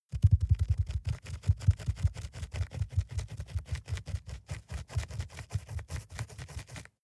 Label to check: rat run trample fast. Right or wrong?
Right